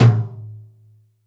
Lite Tom, Roland BK-5

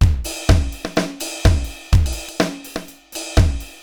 Akai XE8 (125BPM), beats

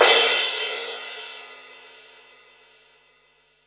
crash R; Zero-G; Chemical Beats; CRASH ZOO

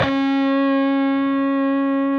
PRS D, Guitars, LEAD

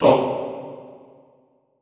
TONG C2, Extended Classical Choir; Best Service; SHORT TONG

